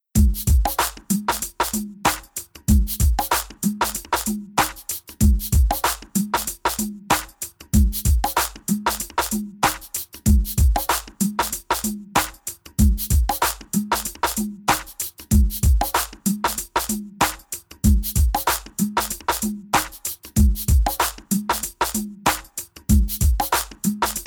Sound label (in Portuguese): Música